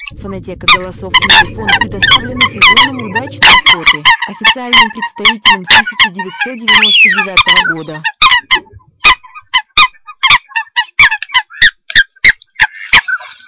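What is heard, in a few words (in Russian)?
Лысуха, манок; plurifon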